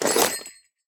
armor heavy; smite; chiron

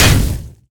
ground hit; smite; guanyu

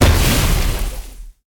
pet fire; smite; vulcan

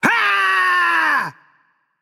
battlecry; smite; xbalanque

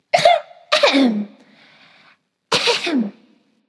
clear throat; discordia

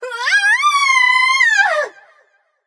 fear light; smite; voicepack; hel

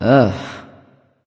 "ugh"; smite; nike

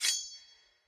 minion sword; smite; npc; roman archer

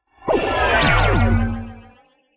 landing; smite; ahpuch skin10

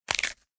idle knuckle crack; smite; kuzenbo

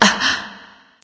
nv surprise; bellona

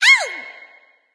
ouch; bastet

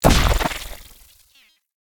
impact; smite; ahpuch skin10

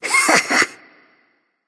heh heh; smite; ratatoskr

